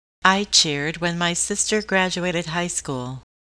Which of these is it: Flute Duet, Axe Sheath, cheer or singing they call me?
cheer